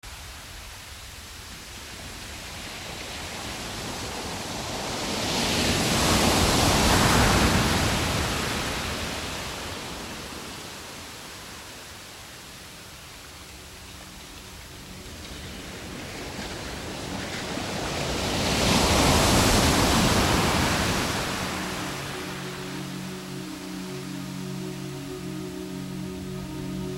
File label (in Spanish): sonidos de la naturaleza la playa